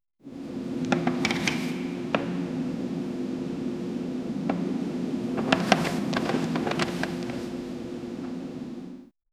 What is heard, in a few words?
subtle and atmospheric, theatre